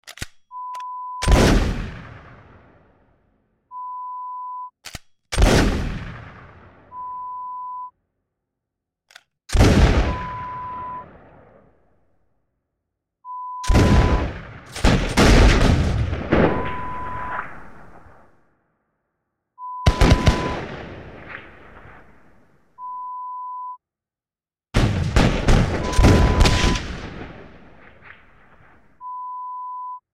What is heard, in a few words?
Musket Fire